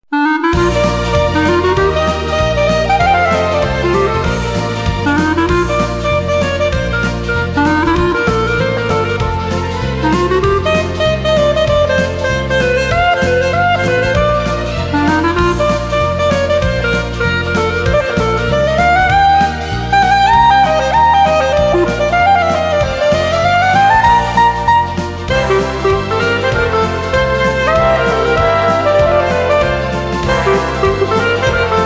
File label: Happy Clarinet